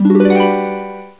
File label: bing